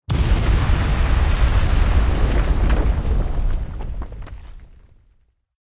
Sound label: explosion; Secret Agent